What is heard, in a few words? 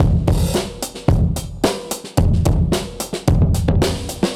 Drum loops, Boombap